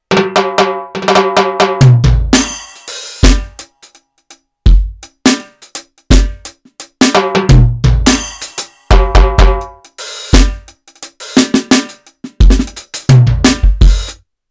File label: Roland TD-4KP